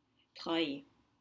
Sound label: treuil winch